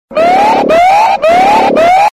Alarm; Coolpad 5010

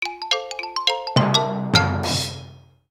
Kids email, Nokia; audio themes

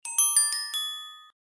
Glockenspiel

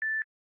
Beep once, ringtones; Samsung; Galaxy E5

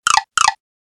Bug, notifications

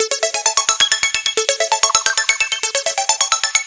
music arp; Samsung; Galaxy S GT-i9008; ophone

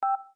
DialPad, Beep; GS390 Prime; LG

